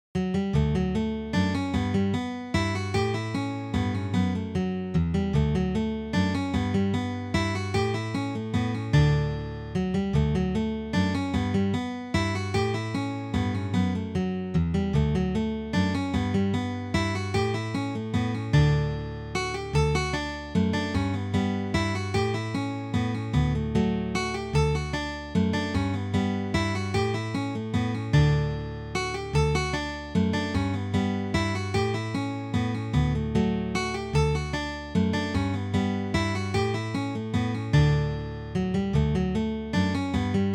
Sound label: Mazurka (solo); John Doherty's Mazurka